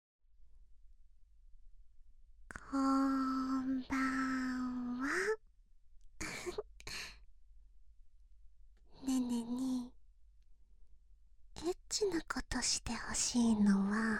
耳舐め手コキ 右耳スタート; 高音質

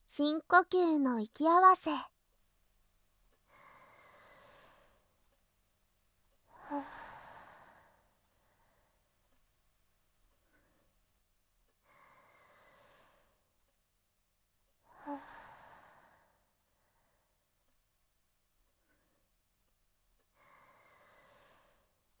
息合わせ（深呼吸or寝息）

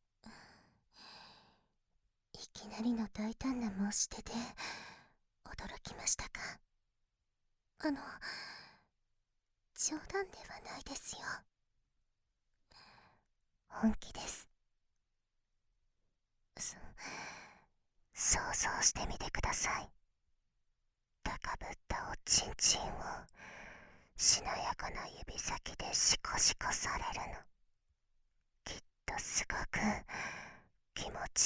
ぐっちょり耳舐め囁き; 手コキ

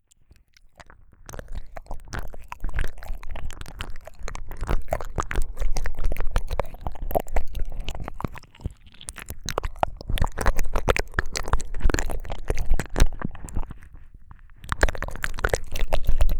両耳舐め, ASMR

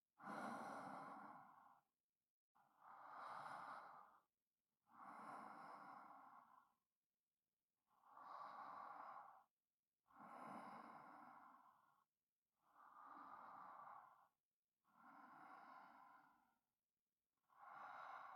安眠用寝息

